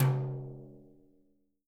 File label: BRUSHES, Living Drums